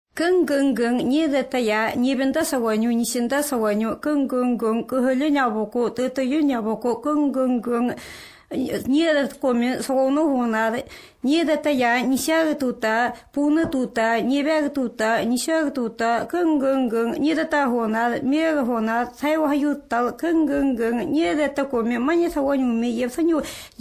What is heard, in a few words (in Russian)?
Энцы. колыбельная